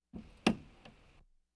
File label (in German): motorhaube entriegeln; Auto-Zweiraeder